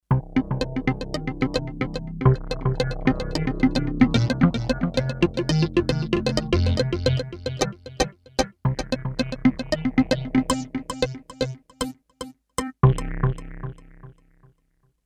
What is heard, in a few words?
ElastOrg, Kawai K5000S